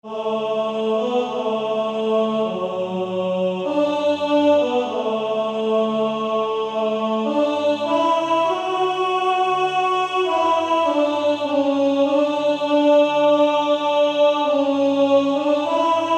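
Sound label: practice music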